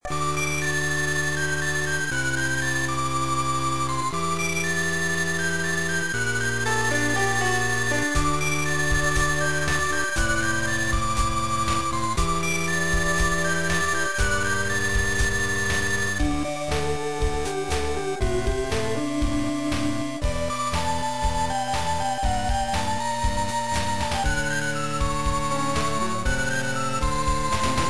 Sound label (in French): musique